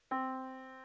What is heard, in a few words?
Yamaha Disk, piano sound